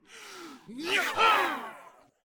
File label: Peasant Attack Vocals